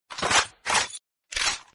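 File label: M249 reload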